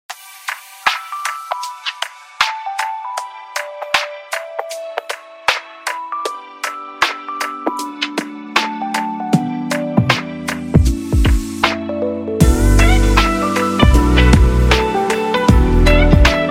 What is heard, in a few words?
Vlog Lofi